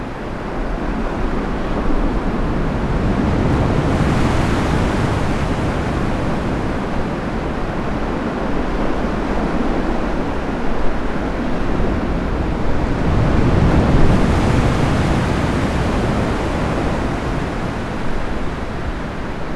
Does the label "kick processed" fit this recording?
No